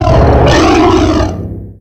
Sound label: pokemon cry; therian